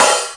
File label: thin open hat g sharp key; open hat samples